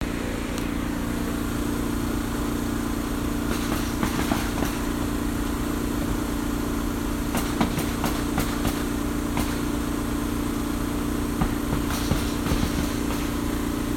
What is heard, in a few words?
noise